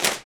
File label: CLP HANDS, Handclaps; Roland LCDP14 Africa VOL-2